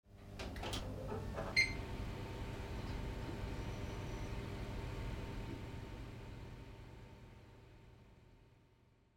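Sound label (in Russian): звук включения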